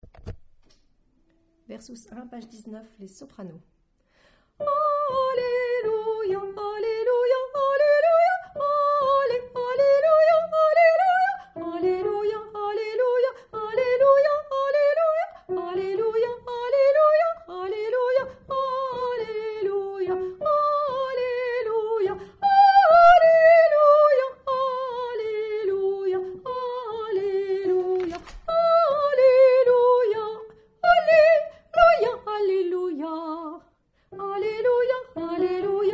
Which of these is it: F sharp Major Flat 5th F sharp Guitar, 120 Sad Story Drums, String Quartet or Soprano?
Soprano